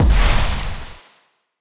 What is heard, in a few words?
explosion; spacemap; explosions